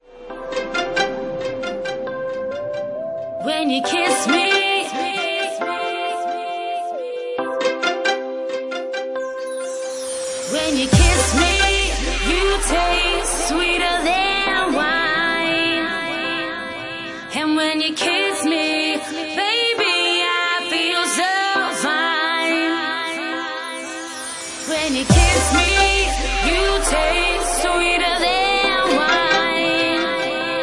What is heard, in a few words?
Organ Ripper